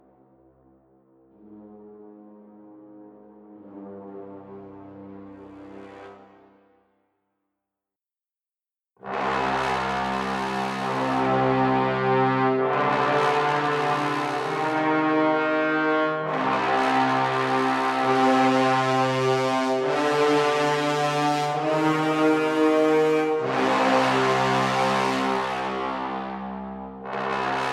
low brass sus stem

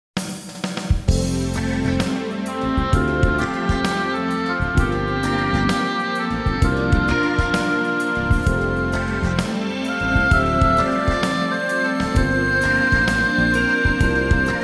ocarina

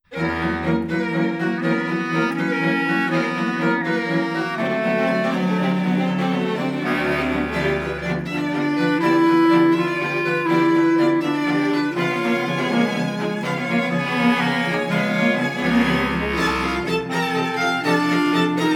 bass clarinet and string quartet

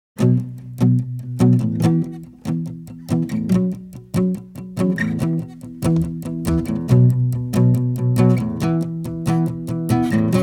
acoustic

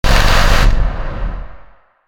hit complex short

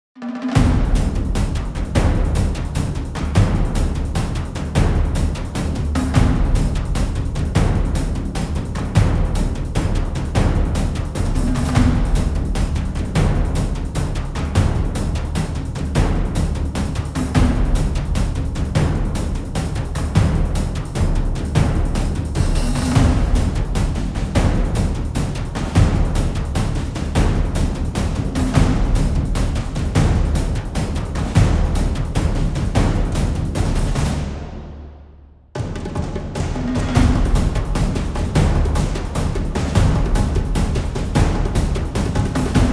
drums

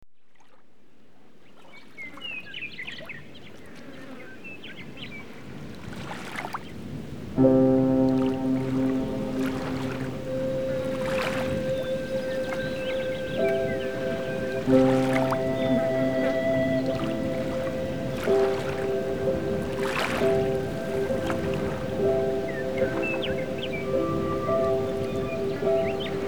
deep meditation morning birds waterfall

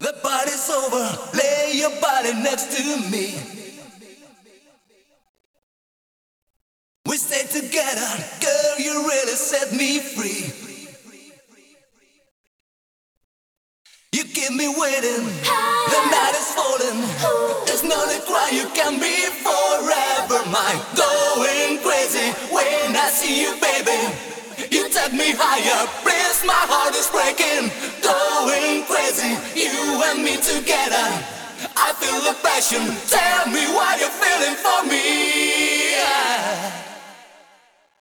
acapella version

